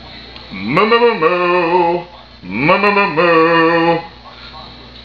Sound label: moo